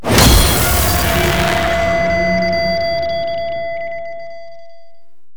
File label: Painting Complete; Gameplay; Warframe; Shadowgrapher